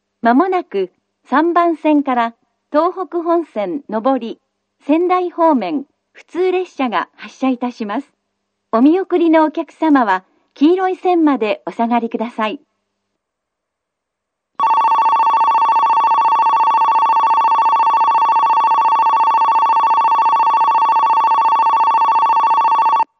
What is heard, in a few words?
kogota 3 bannsenn